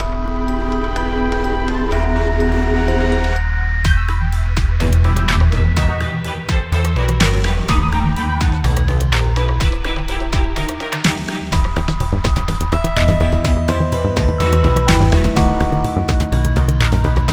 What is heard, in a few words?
Battle bpm125; Zombie Rollerz; Pinball Heroes